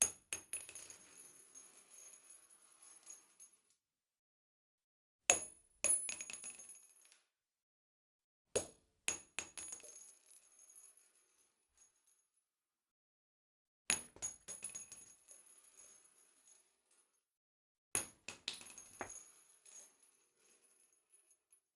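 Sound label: 7.62 x 39 ppu casings shell cartridges impact and roll on tile floor inside; sonniss gdc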